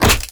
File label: nail release, board; breakables